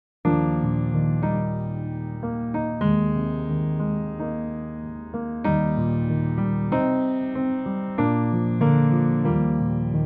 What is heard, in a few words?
Backing; iniciantes